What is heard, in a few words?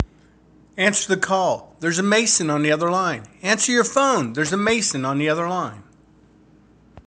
Jazz